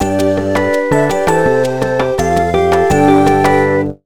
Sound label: Sting Goal Complete